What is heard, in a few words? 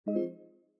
sell house